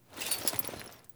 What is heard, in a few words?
Foley Walk; Jotar; Friendly